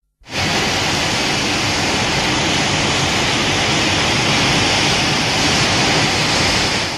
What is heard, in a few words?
atomic breath; godzilla